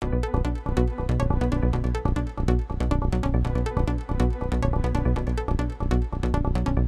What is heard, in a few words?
Breaking News Music Loop